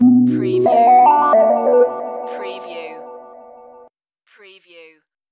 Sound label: Hip Hop Synth Licks 90 BPM - B - Straight